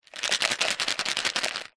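household vitamin tablets in plastic pot lid on shaking fast; zapsplat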